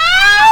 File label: ow